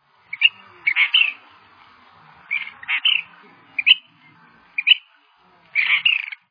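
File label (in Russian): встревоженное щебетание охраняющей птенцов мухоловки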